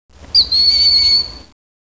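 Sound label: Japanese hawk; cs hokkaido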